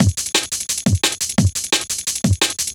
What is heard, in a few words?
DNB BREAK